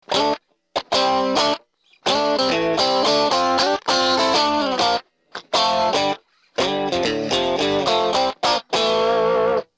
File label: cable